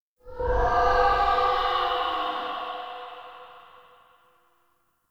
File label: Title Card Add Sad; Duviri